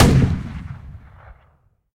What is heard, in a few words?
shot rif tank